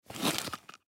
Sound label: Gas Mask Pick Up; Military; Warfare; Blastwave